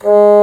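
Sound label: Bassoon p G#1; Digital Sound Factory Virtuoso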